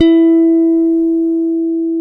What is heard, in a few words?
E-4, FINGER BASS; AKAI S-Series CD-ROM Sound Library VOL-7; FINGER BASS1